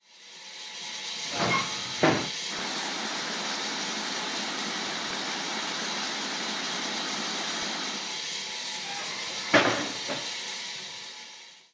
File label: Water Fill; RailPro